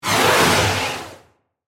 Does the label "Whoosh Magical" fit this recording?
Yes